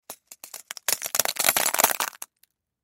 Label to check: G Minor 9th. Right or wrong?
Wrong